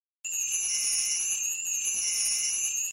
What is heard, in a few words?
Bell; Animal Crossing